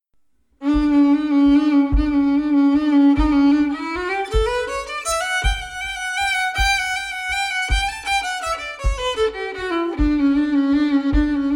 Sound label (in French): Valse